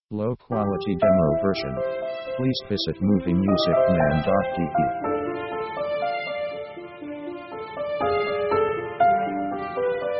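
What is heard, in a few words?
orchestral melo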